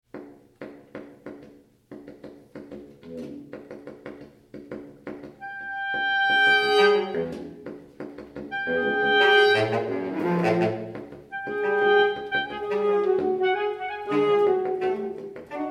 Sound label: Sax Trax